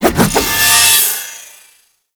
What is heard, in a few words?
Staff Heavy Charge; Warframe; Duviri; Dax; Weapons